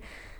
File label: female breath out; Apotheorasis